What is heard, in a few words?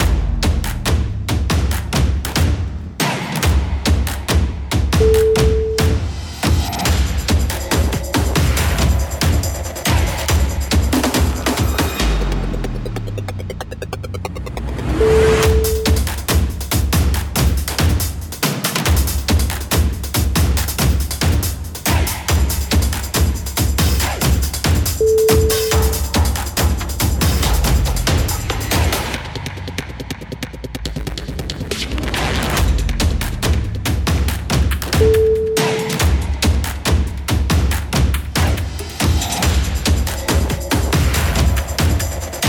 crazy future drums